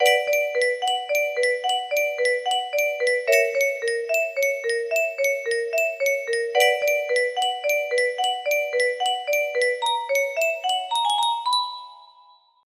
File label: tiny music box; devil plane ost 1